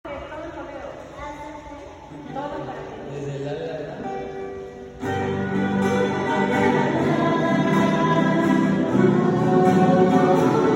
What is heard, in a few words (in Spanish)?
cordero lalala